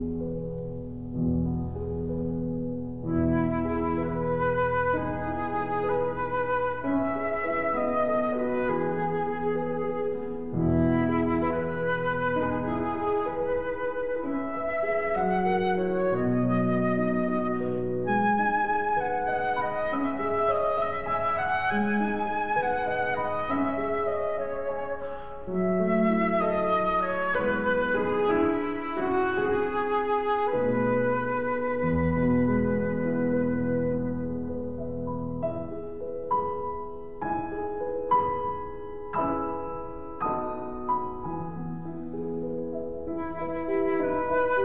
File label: Berceuse (Lullaby) for flute and piano